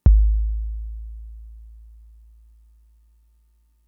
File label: Kick, Drum Machines Fricke MFB-522